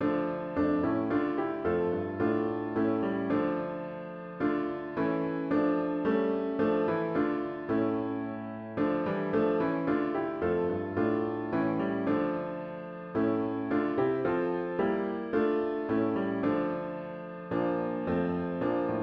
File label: piano only; Source and Sovereign Rock and Cloud